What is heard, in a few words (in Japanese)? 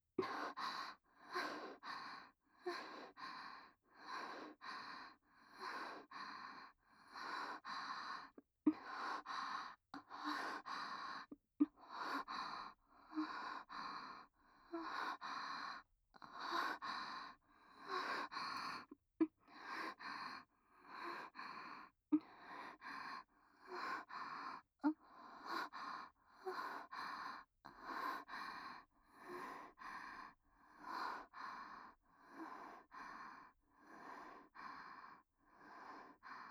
吐息